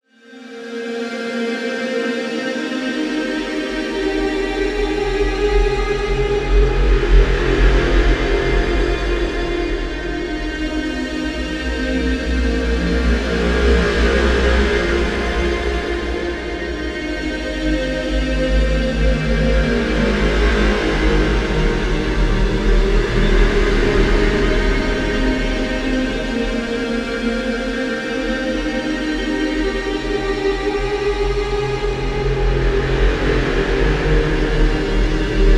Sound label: Catapult Pad 150 F#; shimmer